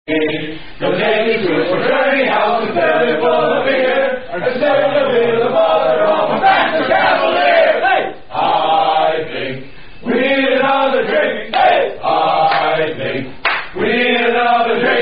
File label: Glee Club Drinking Song; Virginia Glee Club; Rugby Road UVA Song With Silver Goblet Verse